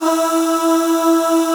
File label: E3 MALE AH; Northstar - New Gold VOL-2